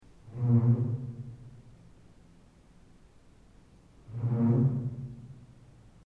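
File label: isu, chair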